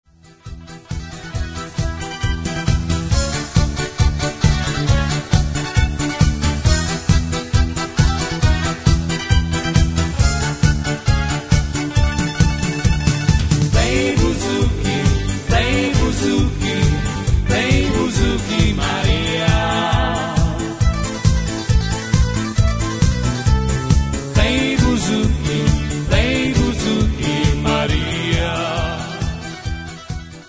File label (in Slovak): buzuky, 30 sekundové ukážky zo živého vystúpenia